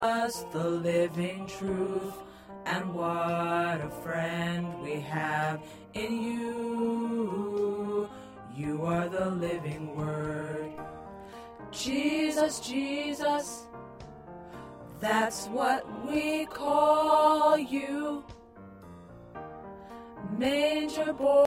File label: P Tenor